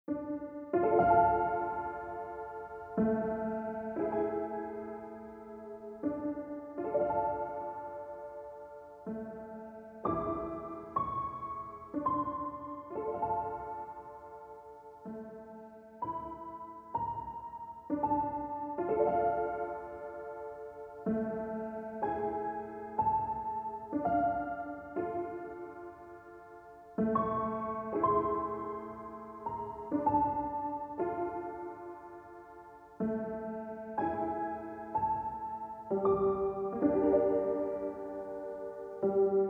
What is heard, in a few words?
piano